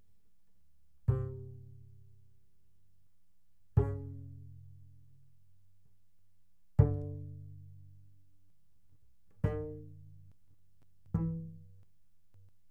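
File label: Bass pizzicato fortissimo sul E C3 E3, doublebass; Strings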